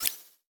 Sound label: Menu Check Box; Custom UI Sounds; The Division 1